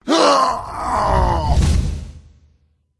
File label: elf death